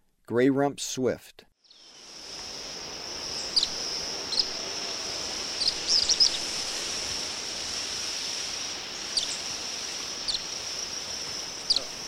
Gray-rumped Swift; S. America; Eastern Lowlands

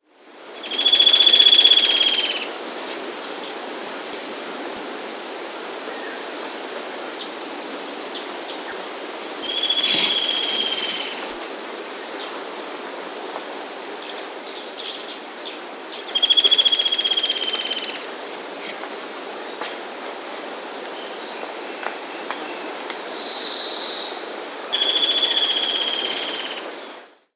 Yellow-Breasted Antwren (Herpsilochm, S. America

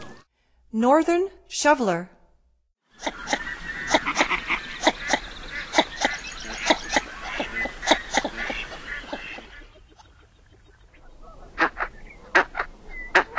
Northern Shoveler; North America; Stokes

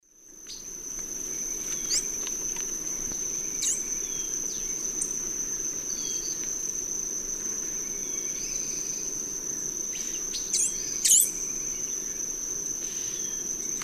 Tersina viridis, calls pair; S. America; Eastern Lowlands

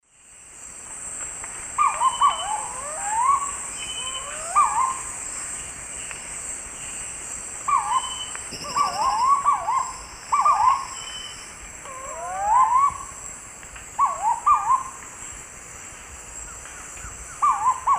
Querula purpurata, calls; S. America; Eastern Lowlands

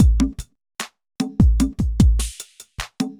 evil beats 75

